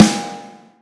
snare

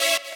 saw

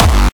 gabber

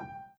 Piano Chord

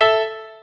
piano

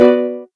soft hitwhistle; osu

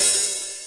soft hit finish

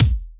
Kick; osu

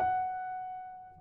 long F#6

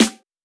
snare

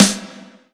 drum hit clap

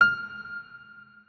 Piano f4

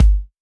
drum hit whistle

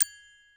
gloken

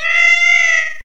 combo break; osu

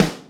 tuned snare

